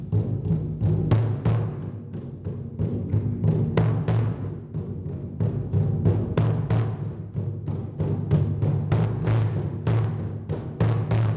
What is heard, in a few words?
clipping bad drum